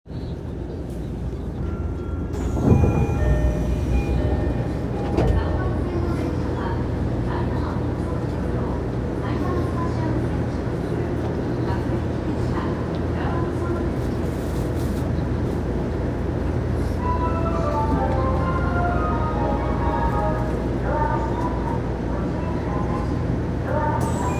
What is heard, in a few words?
train sounds; 南北線後楽園北